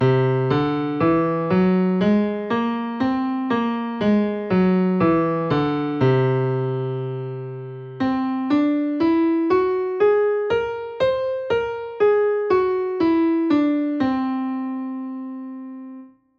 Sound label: Jazz Symmetrical Scales Scale; How To Improvise With Symmetrical Scales